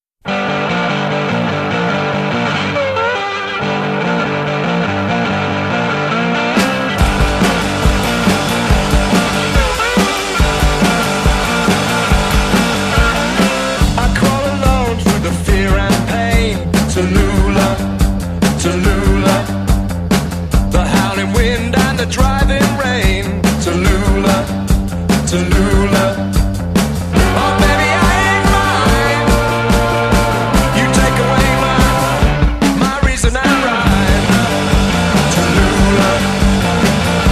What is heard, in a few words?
cowbell